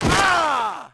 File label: FALL, Rider